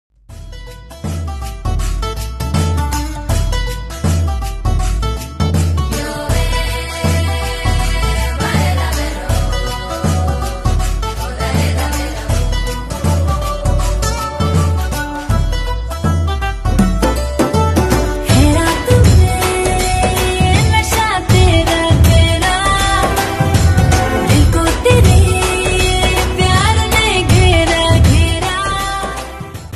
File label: romantic ringtone flute ringtone lovely ringtone bgm ringtone